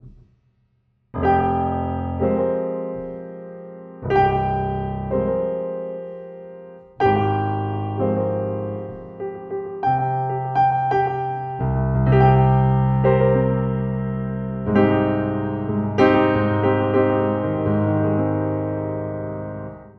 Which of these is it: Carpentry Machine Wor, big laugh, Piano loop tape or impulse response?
Piano loop tape